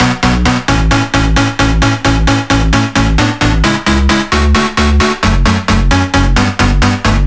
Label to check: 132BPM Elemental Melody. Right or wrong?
Right